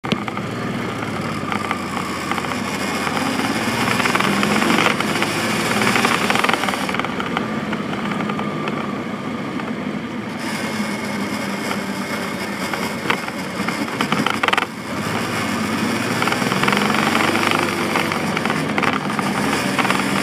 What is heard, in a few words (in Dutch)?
Motor Geluid, Harde ratel in blok bij aandrijving ketting; Transalp; techniek